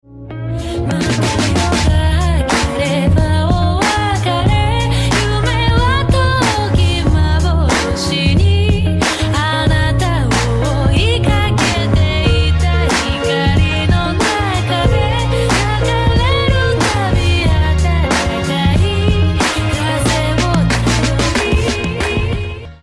applause

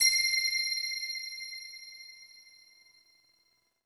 menu back; GGO-Sinonv2

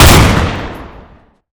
menu hit; troll CSGO SKIN; Shotguns; Sawed-off

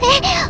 combobreak; RubyKurosawa

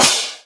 soft hit finish; boom+1025

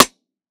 normal hit normal; hitsounds; kodama - nogi; eri

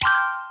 menu hit; Seoul v11 Asta Edit

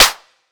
normal hit clap; Azer+ 2023

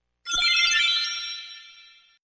pause retry click; Kill La Kill

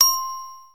match ready; saiyku [rby] instafade

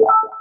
menu hit; [EZ] Ekoro blue

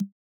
menu click; 『Chip 1.1』

